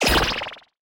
Explosion

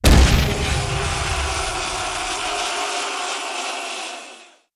big Win Text Change; netent

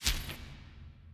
catcher Catch; netent

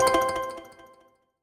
count up end; netent

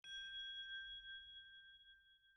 reel bounce; netent

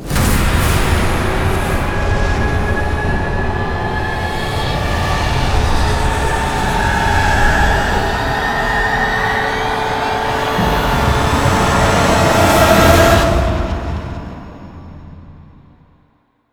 near win

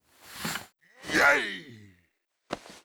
blue Bird Win Arms; netent

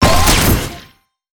sparks wild landing; netent

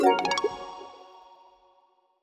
win small; netent

